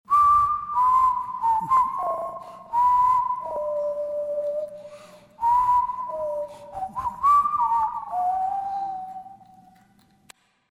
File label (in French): melodie